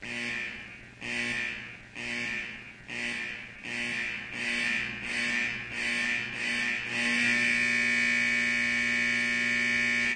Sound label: verby climbing buzz alert; Alarm Sounds